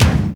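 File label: bass drum; Best Service Gigapack 2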